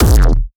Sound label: neuro kick